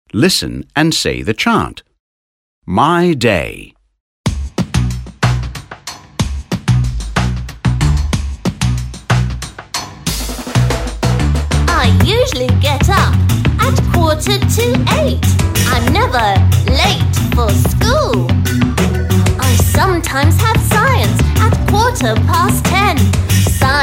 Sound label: Chant